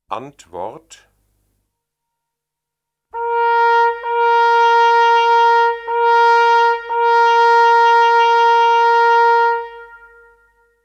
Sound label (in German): Antwort Auf Hegeruf, jachthoornblazers